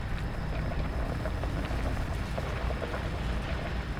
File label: hive minion idle; Stellaris; guardians